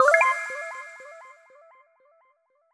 Gain Heart, Kawaii Love Lofi, Music Stingers and Jingles; Kawaii Love Lofi Music Pack 2